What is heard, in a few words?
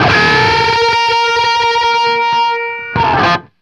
DBLE A#3 CUT, guitar; East West; Steve Stevens Guitar Samples Collection